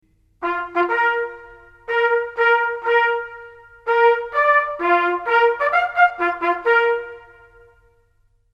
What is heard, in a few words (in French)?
Distribution du courrier, Sonneries reglementaires